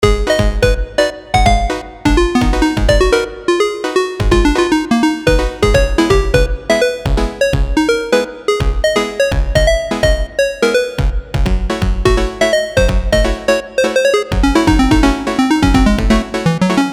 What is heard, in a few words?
Funky but on the brink of war Chiptune